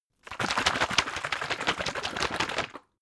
bottle syrup shake fast, gastronomie

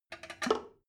drain valve open regular, badezimmer

